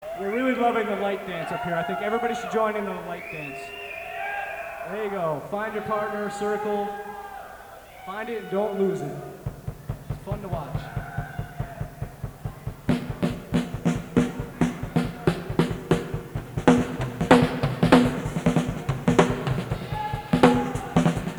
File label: Drums; Light Dance Drum Jam; Norwalk, CT